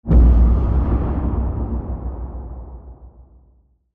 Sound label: explosion filt